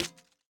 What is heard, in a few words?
drag to trash; dock